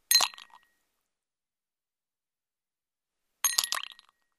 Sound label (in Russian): kubiki lda brosayut v stakan